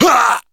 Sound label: pain